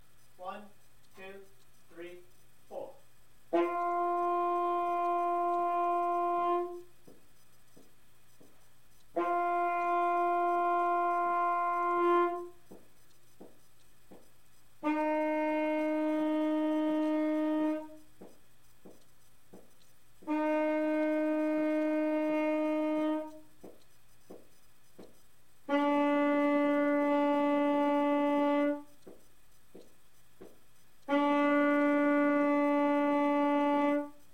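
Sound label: alto sax 1st 5 steps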